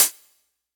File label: Hat; M8; Electronisounds